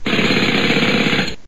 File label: Worker Success; Life is a Game; android